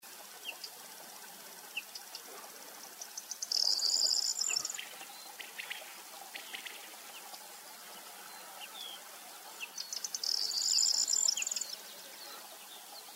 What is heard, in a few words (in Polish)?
potrzeszcz, ptaki